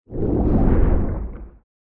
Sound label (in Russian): bystroe dvizhenie torpedy mimo; Звуки подводной лодки